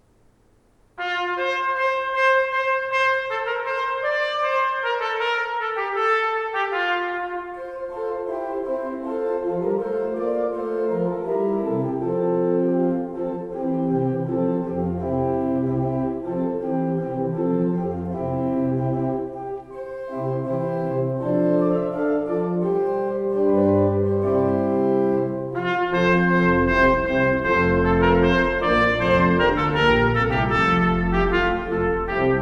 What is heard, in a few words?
tpt org; weddings; marcello; the heavens declare